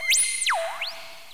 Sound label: SOUND DEICONIFY; enlightenment